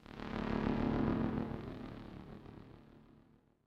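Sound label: oracle ambience; assassin s creed chronicles india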